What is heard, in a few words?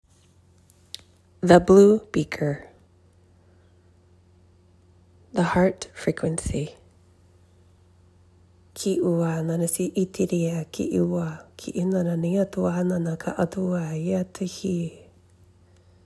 heart frequency; blue light language; water experiment